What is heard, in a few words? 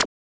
Tech Zip; Genesis